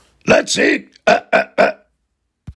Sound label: eh eh eh